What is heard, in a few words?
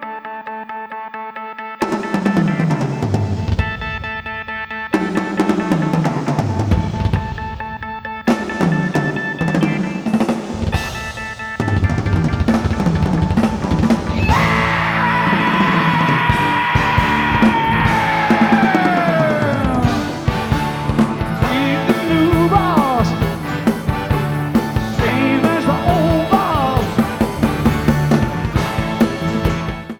Drum Solo; 1989 Tour Rehearsal